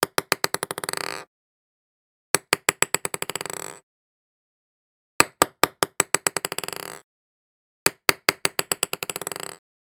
ping-pong ball; devices accessories utilities; NS Studios - ultra sound collection

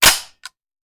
Machine Gun Foley; Sound Ideas; Guns

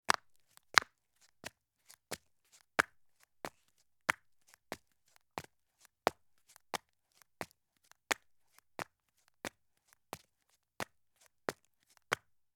Flip Flops Take Slow Steps on Concrete Stairs; Sound Ideas; Footstep Sound Effects

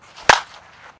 Baseball Bat Crack

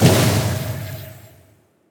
Water Spell Cancel; AudioWizards

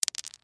dice roll; Dice World

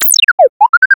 Arcade Chirp, Video Game Sounds; 1001 Sound Effects

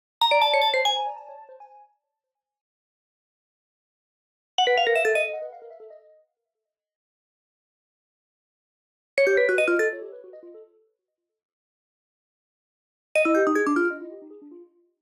Arcade Jingle Negative

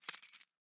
Keys Drop; Automobiles; 1001 Sound Effects

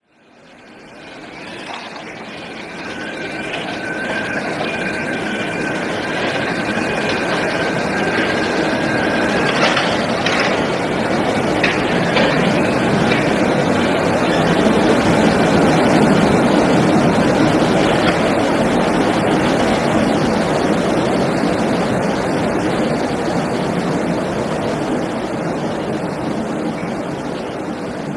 stereo street; Pitch Black - A Detective's Demise

